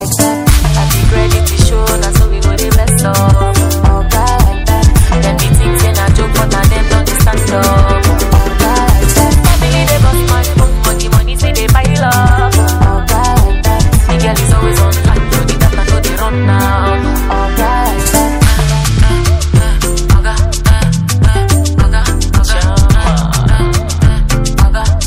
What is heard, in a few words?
new african ringtone